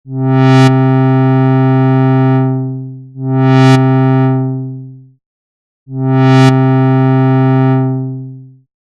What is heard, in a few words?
ADSR